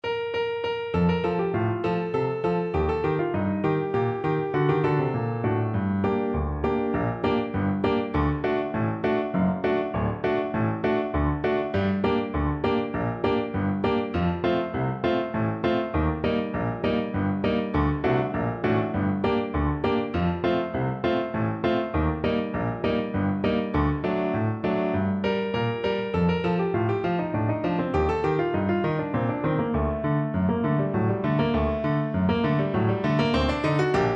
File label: trumpet